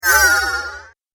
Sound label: Scary Tremble Pulse Spell, magic sound effect